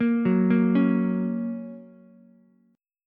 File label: Bb Minor Sharp 5th, Ukulele; arpeggiated; JGuitar